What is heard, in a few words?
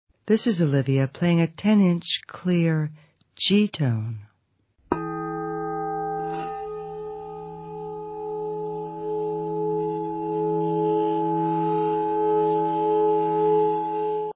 g tone